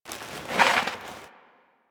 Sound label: Hunting Bow Load; Warframe